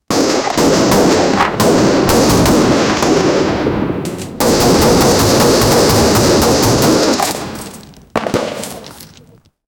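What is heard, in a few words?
bullets barrage fire around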